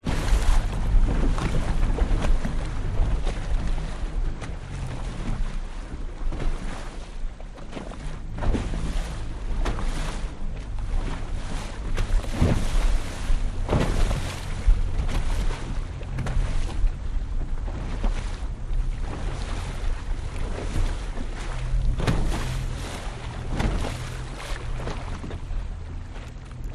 transport boat drive sea slight choppy slowly outboard motor 16 ft fiberglass; at bow